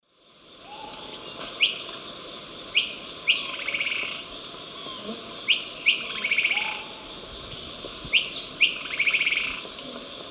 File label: Automolus melanopezus, song; S. America; Eastern Lowlands